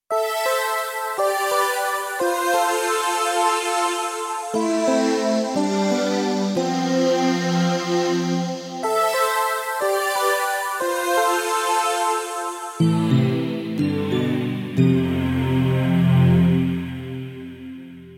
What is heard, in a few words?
Air Piano; Kawai K1